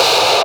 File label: FUZZ CLAP; Distored Reality